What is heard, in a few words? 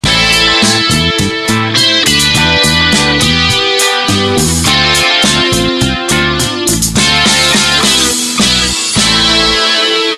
Groove ride; Sony Ericsson; W595